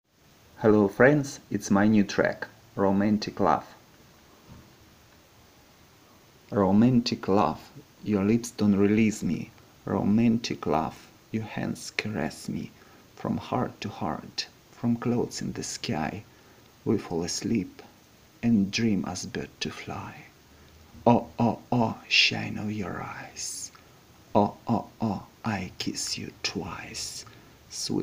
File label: Romantic Love Lyrics Version